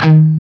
CHUG D#2 L, Guitar; East West; Steve Stevens Guitar Samples Collection

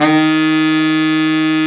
BARITON SAX

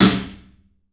CLUB SNARE; 3D Sound; 3D Drums

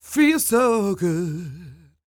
croon, Vocal Planet Blues Soul; Spectrasonics

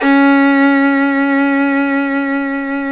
FIDDLE NOTES, C#4, L

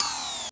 Cymbal Bend Down; Lost Tapes From Mars

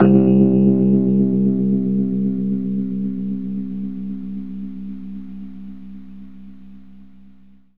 Rhodes fast C; 3D Sound; 3D Keys VOL-1

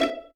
Violins pizzicato, E4

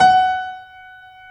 STEIN F#4, STEINWAY GRD; Greytsounds VOL-1

